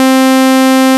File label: Saw Wave C3